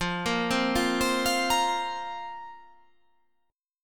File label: F Suspended 4th F